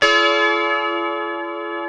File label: D#4 C funk chord, guitars; Spectrasonics; Hans Zimmer Guitars VOL-1; 90's Sample CDs